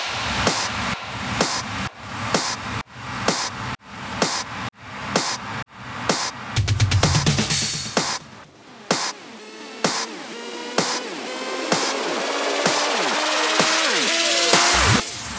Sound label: Fills 128BPM 8 Bars; Vengeance - Essential Clubsounds Vol.5